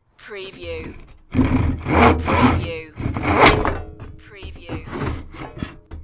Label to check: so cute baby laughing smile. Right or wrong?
Wrong